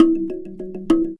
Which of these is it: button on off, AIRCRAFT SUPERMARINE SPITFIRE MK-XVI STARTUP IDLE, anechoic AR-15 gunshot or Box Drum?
Box Drum